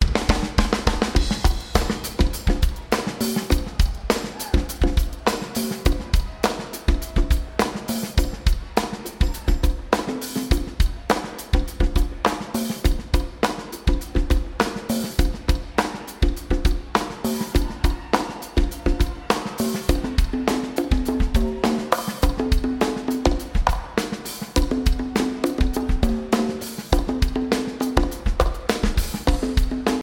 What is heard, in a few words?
Drums